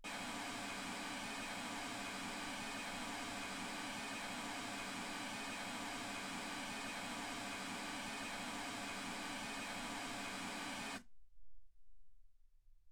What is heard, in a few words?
mls 48kHz